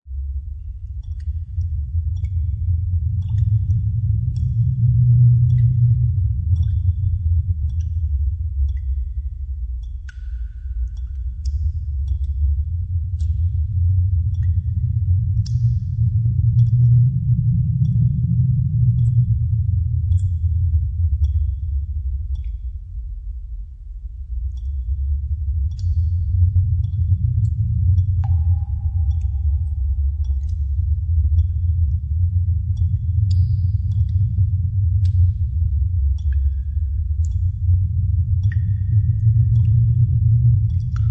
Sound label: ambient Dungeon